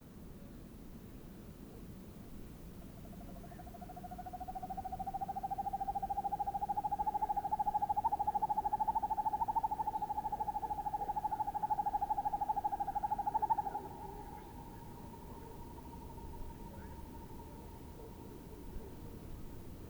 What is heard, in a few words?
Northern Hawk Owl Hooting of two males